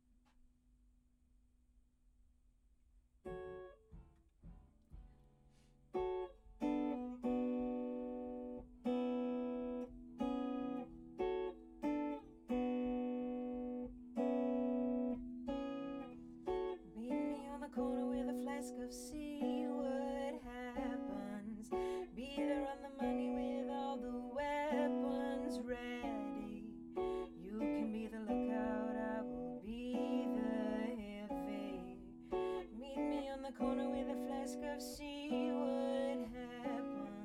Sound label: Act Guit L